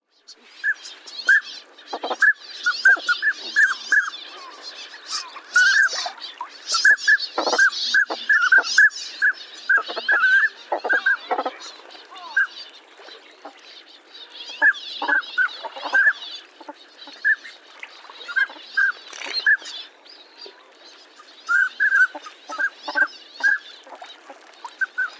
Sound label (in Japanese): オナガガモ, さいたま市